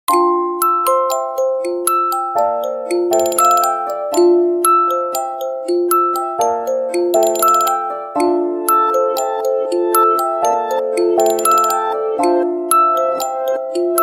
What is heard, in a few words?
GuitarMusic